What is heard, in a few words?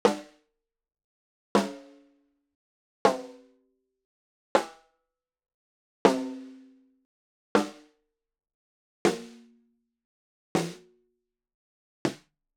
Snare Canopus Birch